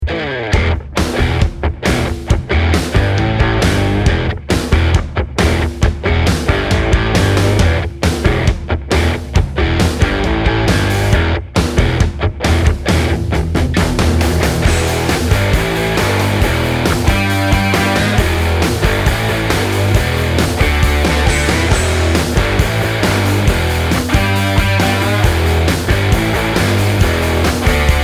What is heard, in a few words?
rock